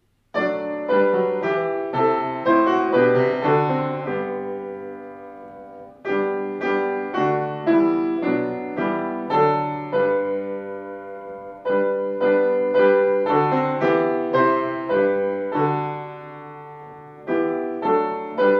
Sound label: Doxology 三一颂 Piano only; hymns; midi; lifehymns